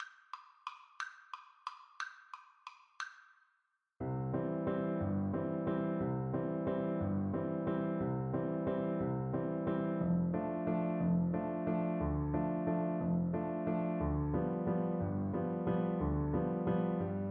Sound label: strauss vienna viola